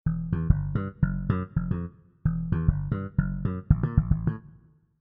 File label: slap funk comp